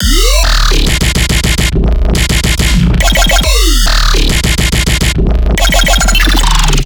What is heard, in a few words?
Riddim Bass Loop - X 140; Chaos; Ghosthack; Riddim Essentials Volume 2